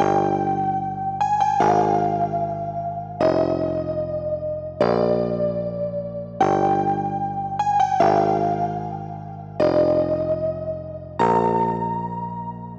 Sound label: Melody Loop 150 BPM C Min; Cymatics - Astro Skeletons